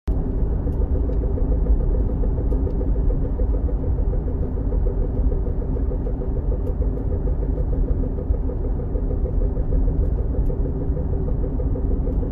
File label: intermittent knocking sound, Discovery Sport